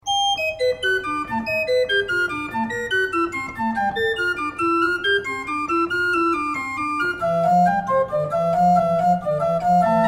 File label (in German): Gedeckt und Principal